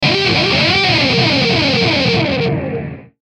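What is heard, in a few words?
Musical Element, Drum Snare March; Blastwave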